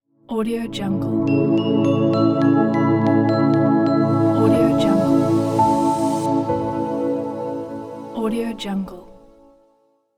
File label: heavenly logo; zigro